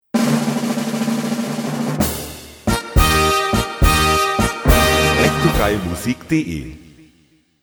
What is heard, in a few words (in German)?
Tusch tata tata tata Wirbel